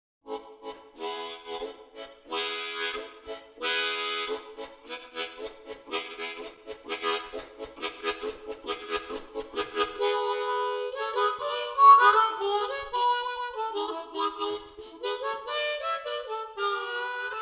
harmonica pirate

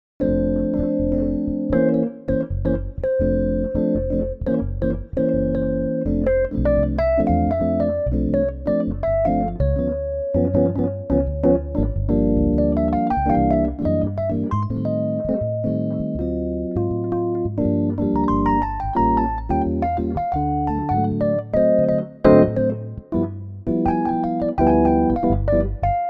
bossa; preamp

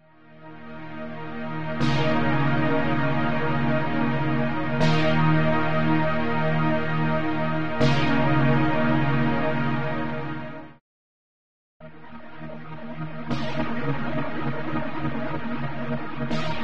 pad unprocessed and pad flange

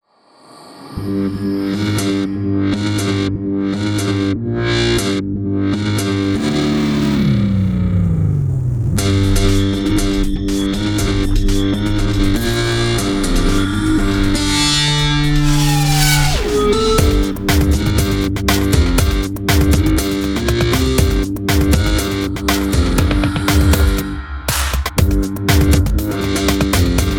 break moon beat; galaxy x

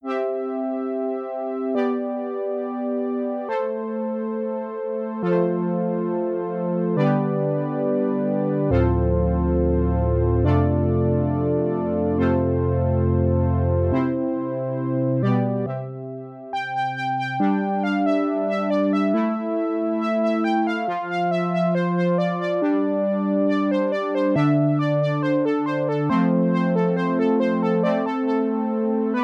soft brass

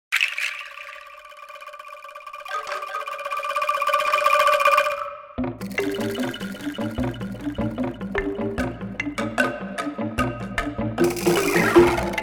angklung; cars

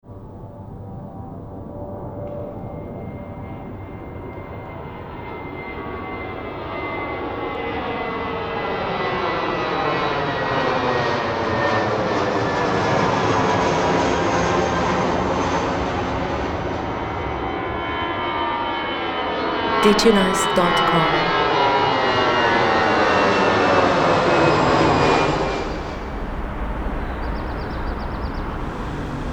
ambisonic city audio

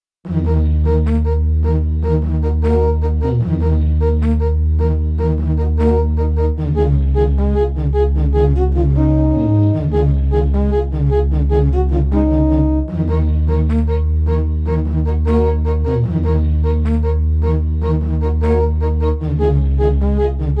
sea trumpets

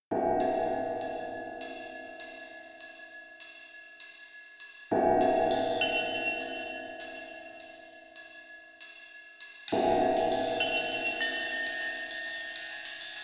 misc metal

